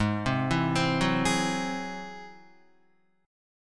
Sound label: Ab Augmented 7th Ab down Guitar; Ab+7; arpeggiated; JGuitar